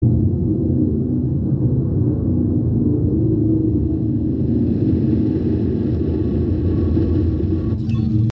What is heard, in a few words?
Alien amb; Resurgence